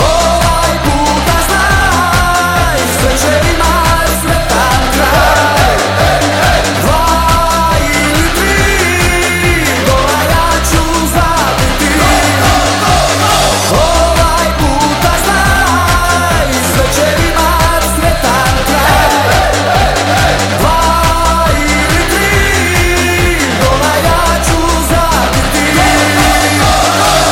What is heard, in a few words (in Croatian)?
sretan bozic